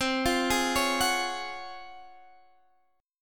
C sharp Major 11th C

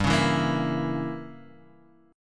G Diminished 7th, Guitar, Standard

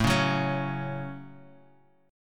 A 6th, Guitar Open F, chord; 9,8,6,8,x,x; strummed

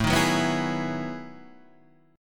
B 13th A, chord

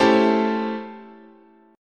A 7th Sharp 9th, guitar chord

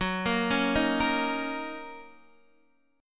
F sharp 6th, down, Guitar, DADGAD

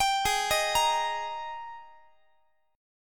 G sharp Major 7th Suspended 2nd, Guitar; G#M7sus2 Chord

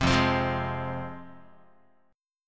F Minor, F, Guitar; Fm strummed; 3,1,3,3,0,x; 4A,4E,5A,5D,5G#,6C#; JGuitar

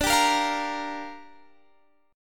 G Minor 7th, D

